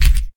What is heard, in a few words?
Keypress Return; Motorola; Moto Z Play; ui